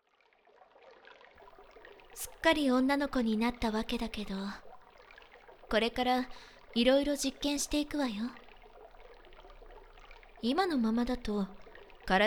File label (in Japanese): ディープキス・乳もみ・尻揉み